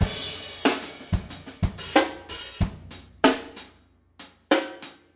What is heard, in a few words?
funki real and sparse, 093 bpm, drum loop; 091 to 100 bpm